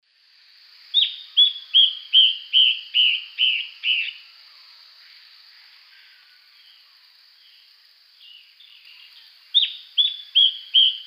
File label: Hypocnemis hypoxantha, song; S. America; Eastern Lowlands